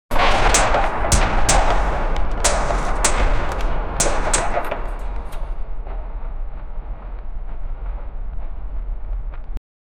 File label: epic battle ambience clashing swords